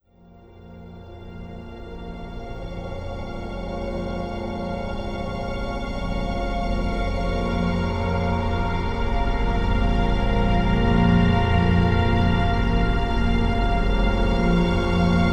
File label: Dissonant Bed; Beds; Sonic Foundry (Sony Creative Software) - Slow Silhouette